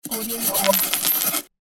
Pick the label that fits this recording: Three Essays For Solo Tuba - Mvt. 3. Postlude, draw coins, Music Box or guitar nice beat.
draw coins